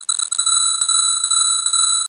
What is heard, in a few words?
Drill